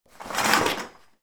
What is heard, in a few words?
household curtains heavy large pull